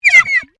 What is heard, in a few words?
Underwater Airhorn Burst Short, compressed air airhorn recorded underwater; Nature